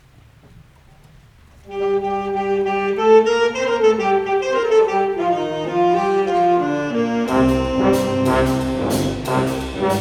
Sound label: Waltz; Central High; central bands